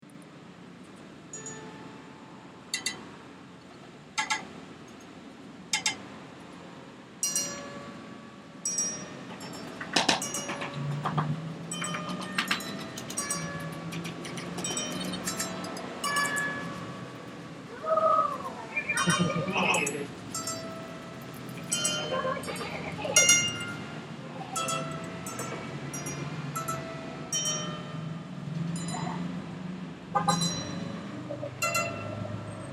party harp; weeklybeats 2012

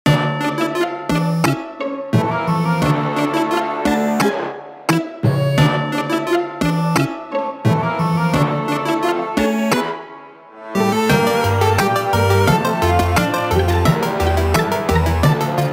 chiptune orchestral; restless crypt

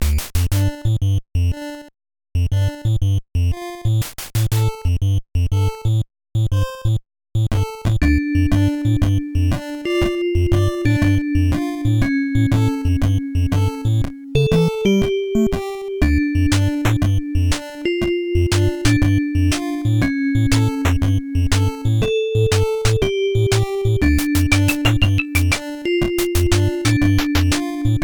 halloween groove nes vrc7; weeklybeats 2024

